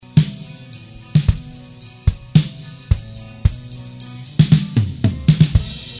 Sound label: drums